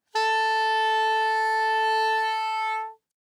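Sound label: Soprano Saxophone non vibrato ff A4; Woodwinds; Bb Soprano Saxophone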